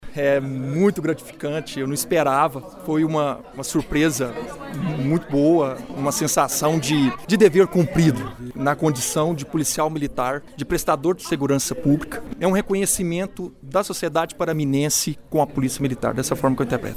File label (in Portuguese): aplausos; moção